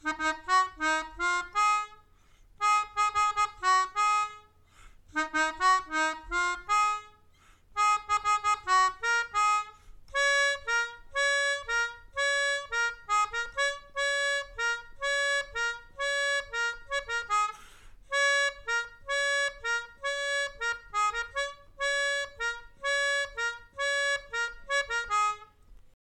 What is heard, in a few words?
Melodica